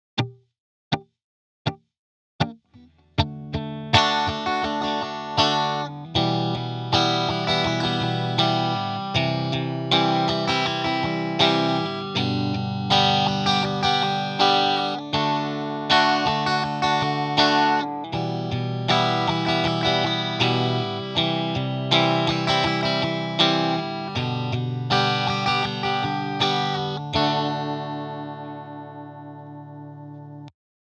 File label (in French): Rythmique; Les doubles croches; Les rythmiques II